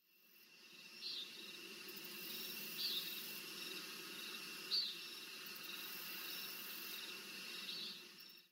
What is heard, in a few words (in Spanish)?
sonido de noche